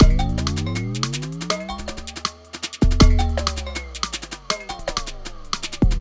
Terrestrial Hybrid, Processed Percussion

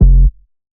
Bass F, Bass Synth

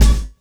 CUT, Drum & Bass; Ueberschall; Drum & Bass Resonance

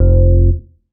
bass classic sinus F; Polyend; ARC Noise

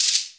GR CHEKERE; Best Service Advanced Media Trax Modern Composer

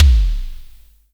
K, ANALOG; Roland MV8800; ANALOG CRAZE

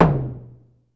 Drum X Tom Misc; Pearl Drum-X

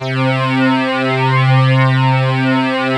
FLANGE C4; Greytsounds - Scott Peers Sound Engineering

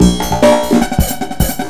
COMPUTER; USB Soundscan vol.25; AKAI

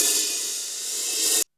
REV HIHAT; Back In Time Records; Big Bang; ST.REV.HH+CY

